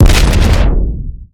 damage hits explosions; World Of Warships

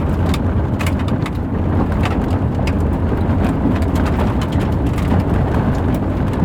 vibration on landing cockpit

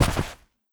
snow; Rust

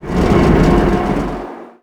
tank frames

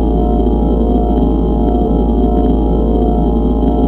floater loop

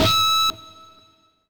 feedback

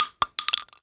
shell bounce, weapons, shells; ModernWarfare

